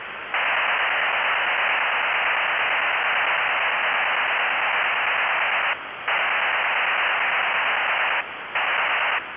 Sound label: SECOM-H SYNC SEQ; SECOM-H transmission with synchronization bursts (several sequential 104ms bursts on a single frequency); ROHDE & SCHWARZ SECOM-H; PSK-systems